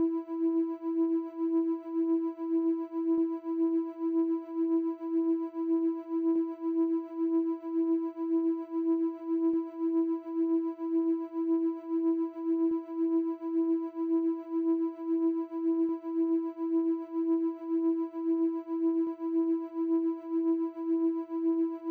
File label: Microwave